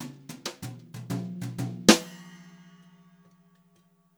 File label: FILL, Brushed Drum Loops; JAZZY; USB Soundscan vol.39; AKAI